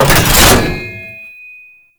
Tank Cannon Charge Start; Enemies; Weapons; Lasrian Tank; Warframe

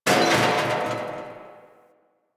Garbage Can Rattle; Warframe; Ambience; Nineteen Ninety Nine

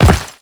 Step Rock, Footsteps; Warframe; The New War; Characters; Kahl

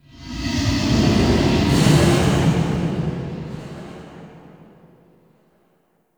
Murex Growl Interior; The New War; Ambience; Cetus; Unum Tower

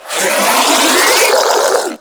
Infested Ancient Melee Mid Spin Scream

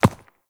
Ram Step Walk Dirt; Animals; Duviri

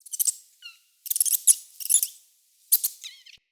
Witch Station Rat Chatter; Ambience; Soul Plane

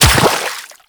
Run Water, Footsteps; Warframe; The New War; Characters; Kahl

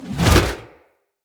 Shield Whoosh; Weapons; Enemy; Blunt; Hammer And Shield Weapon

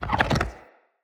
Fire Rain Ground Rupture; Powers; Fenn

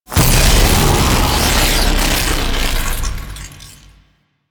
Lightning Stomp Land; Warframe; The New War; Archon; Boreal; Abilities

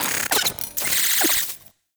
Venus Robo Fish Large Caught; Warframe

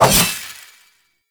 Two Handed Nikana Swing; Warframe; Duviri; Dax

